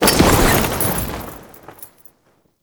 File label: Paratrooper Balloon Drop Off; Warframe; Lasrian Soldiers